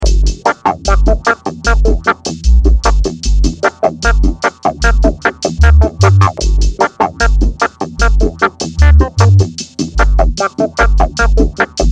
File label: Minor Dub